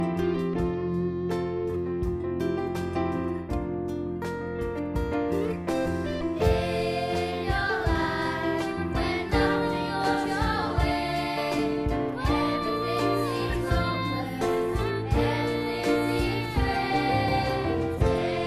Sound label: live version 2 part song for treble voices